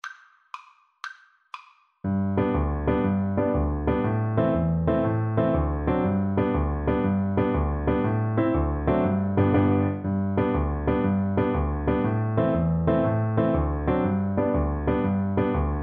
irish jig medley, Piano Duet

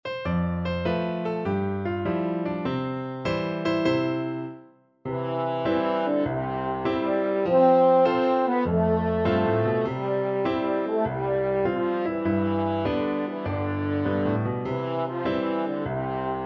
french horn

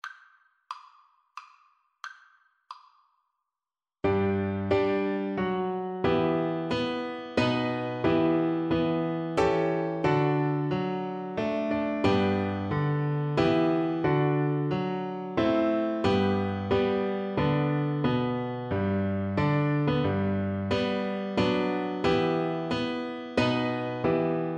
star spangled banner, Double Bass

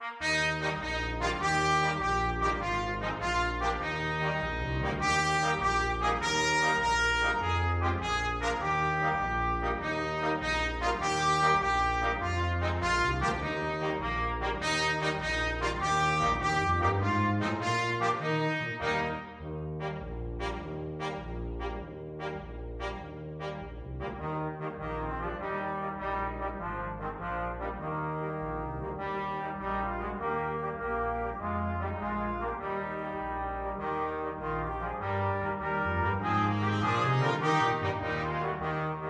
itsy bitsy, brass quintet

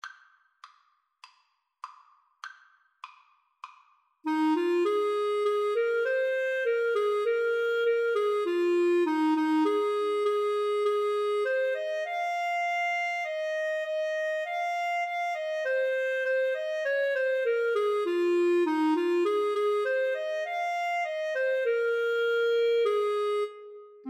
loch lomond, Clarinet-Saxophone Duet

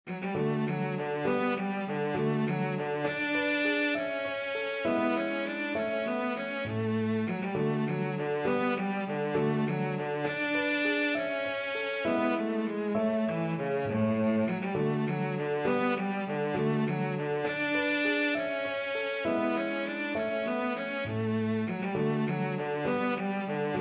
Gigue G Major, cello

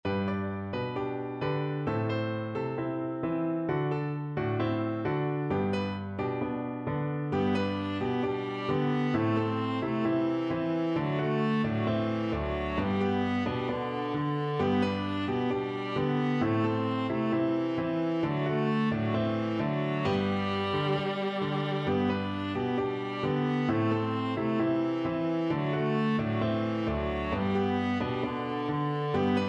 viola

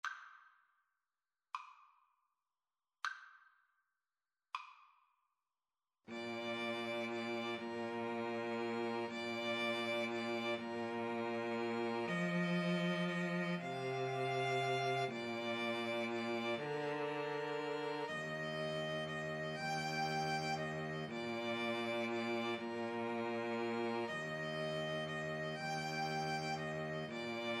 flute violin cello

